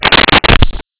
rope snap

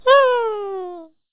yey

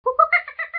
monkey noise

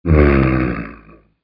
giant noise

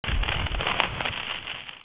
FUSE

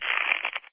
bow stretch short

